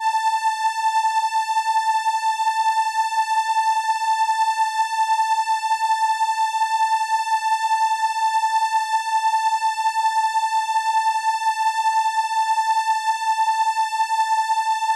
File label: Viola A6, ARP Solina